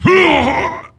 Wound Medium; Jaraxxus

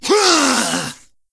Attack; Ionar

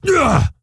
Stonebark Wound; Elder Stonebark

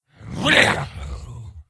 Attack; General Vezax

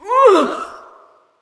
Creature Attack Medium; Eydis

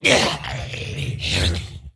Wound Crit; General Vezax

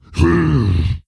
wound; Moorabi

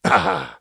Wound; Voljin

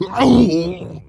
wound crit; Moorabi

